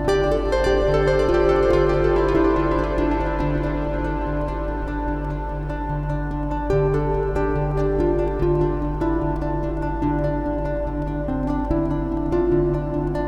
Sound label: loop; Ad Infinitum #007